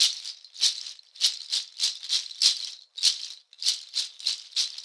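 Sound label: shaker, Synclavier Percussion, World & Orchestral; ILIO; Synclavier Percussion 2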